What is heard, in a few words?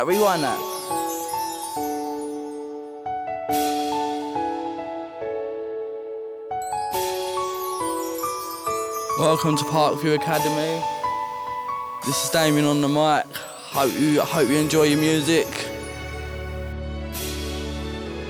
Rap